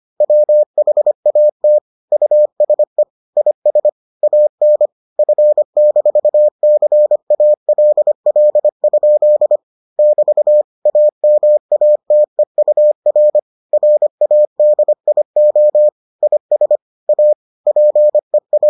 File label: morse